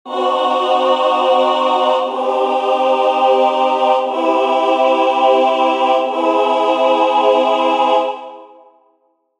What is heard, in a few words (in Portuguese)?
4 triades; musicologia